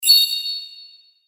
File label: Bell